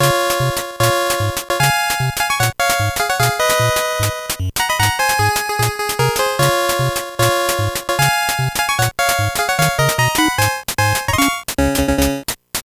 Ending, Normal Game (Retro); bomberman party edition

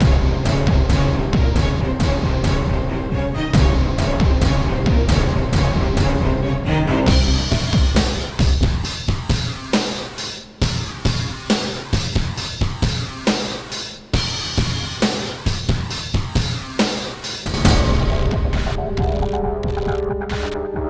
Level Music

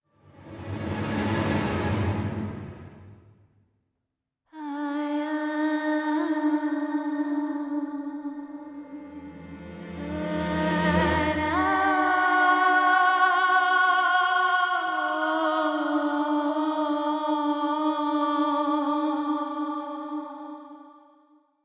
Monster Hunting; Skellige

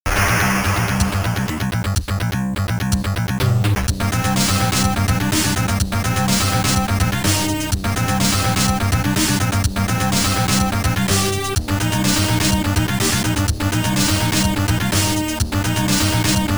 Stage BGM Amiga; Street Fighter II; U.S. Gold collection

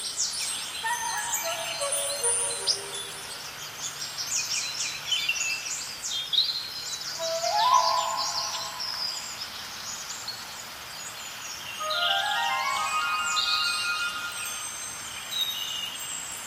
Easter Ambience; Angry Birds Seasons

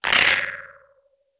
bumps; SFX dw bumps2mod

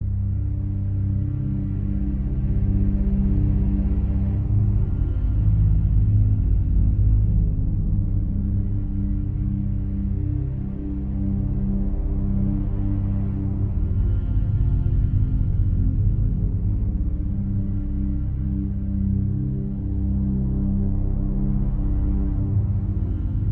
loop Ominous

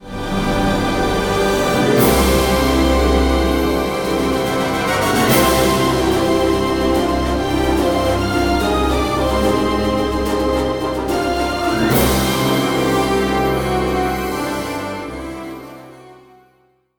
Objective Achieved Stinger

